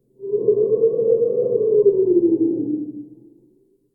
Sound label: Wind